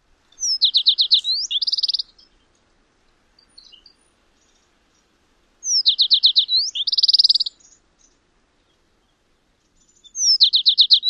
songs

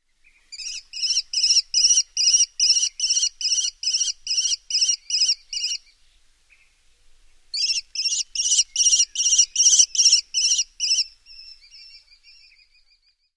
calls of male

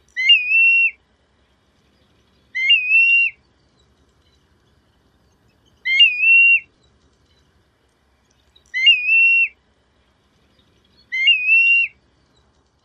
pleeooee calls

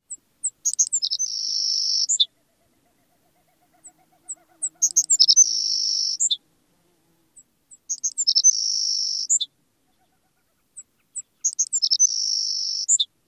songs; AK

